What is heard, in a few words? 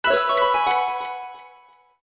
Japanese slide close; Nokia; Audio themes